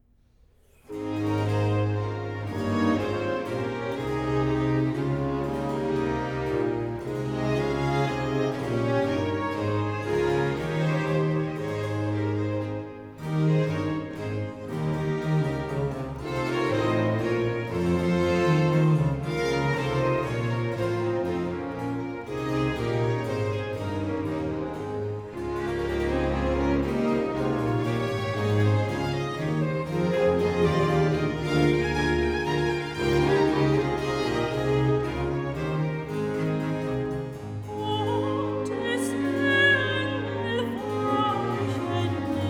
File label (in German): Aria Gottes Engel weichen nie (Soprano); Man singet mit Freuden vom Sieg, BWV 149